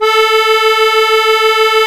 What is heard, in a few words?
musette A3, accordion